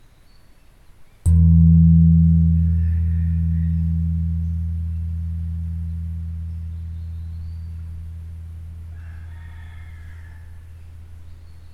Gong Medium In The Temple

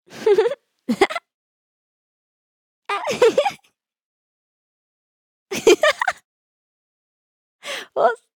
Girl Giggle

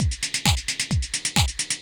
Tech loop

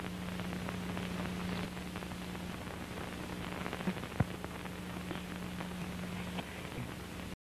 Static

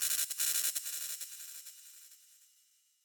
Electric discharges

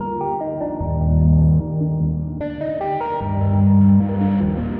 Synth Loop 100 Bpm

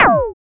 Laser

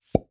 Plunger Pop

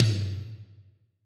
Mid Tom

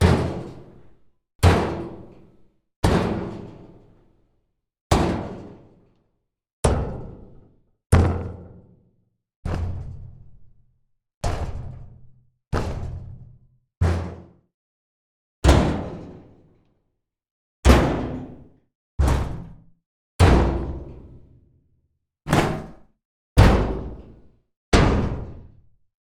Metal Impact Medium Bang Resonate

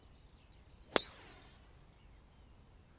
Golf

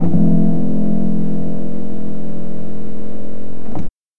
Piano

